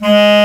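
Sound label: Bass Clar mf G#2; Digital Sound Factory Virtuoso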